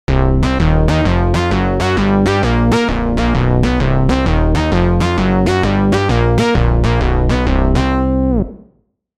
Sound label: Reverb Bass; PRO12; opxpro2